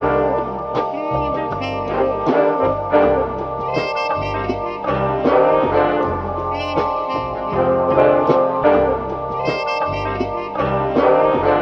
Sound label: horns soft jazzy, phonky loops